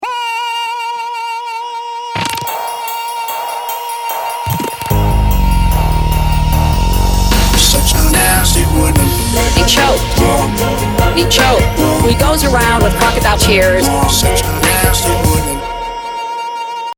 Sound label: Ringtone